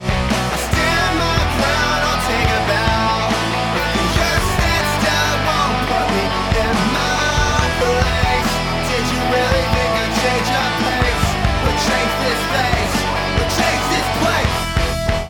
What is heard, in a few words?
Vox No Dyn EQ